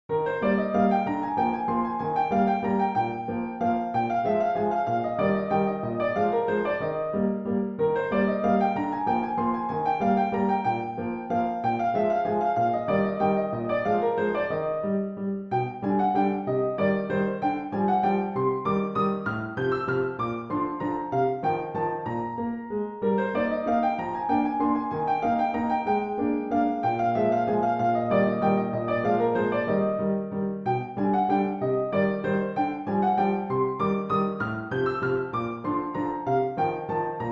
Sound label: Waltz in E flat major; Beethoven; WoO 84